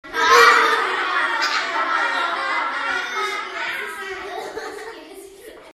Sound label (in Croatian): Ruganje